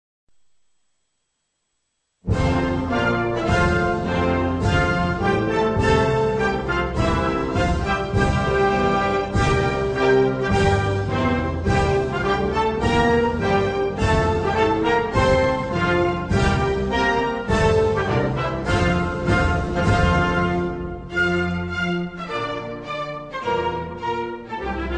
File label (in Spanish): Himno nacional de Emiratos Árabes Unidos